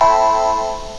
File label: Newton Chord